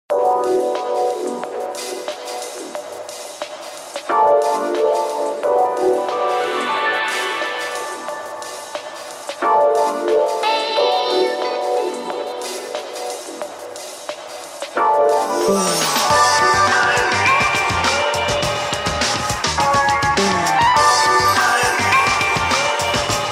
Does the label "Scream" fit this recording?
No